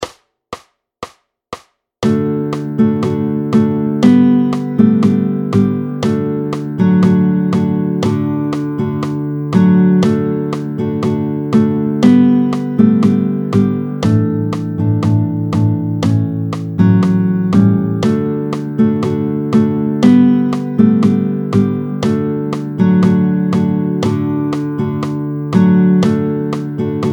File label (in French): Ensemble. Vite tempo 120; Exercice chapitre 16 pages 67-68